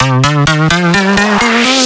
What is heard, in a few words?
filler tool Cm; Electro; filler tools; Anthemic